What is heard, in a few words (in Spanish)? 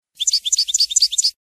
floreo bachi bachi, campero